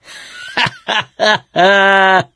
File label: laughter, survivor; voice; source engine; left 4 dead 2